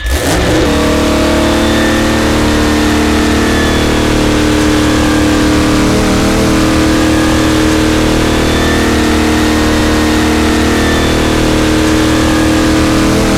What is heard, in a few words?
jalopy second turbo